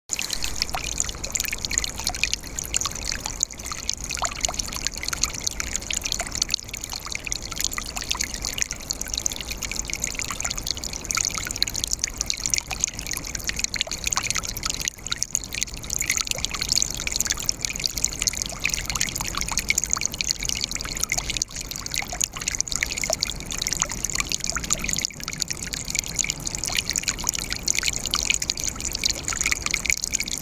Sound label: park wood stream